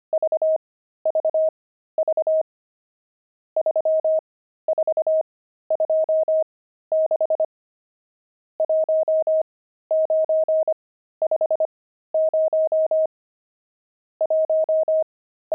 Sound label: pangram, intermediate pangrams files